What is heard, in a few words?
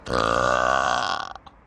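burp recorded after drinking a famous soft drink; luchshee za vsyu istoriy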